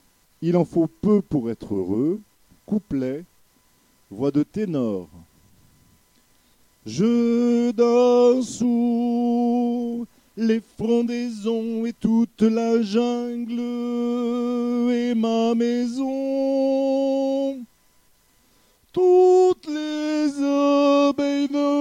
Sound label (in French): Couplet voix de Ténor